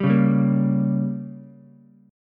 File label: Bb Minor 6th, Ukulele; strummed; JGuitar